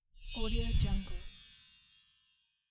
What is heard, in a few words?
crystal shine